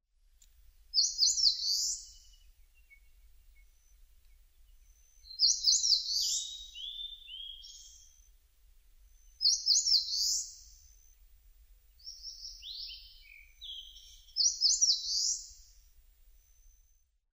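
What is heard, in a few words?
eastern crowned warbler; sendaimusikui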